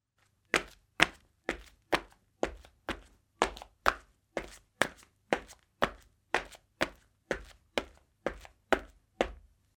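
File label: footsteps sound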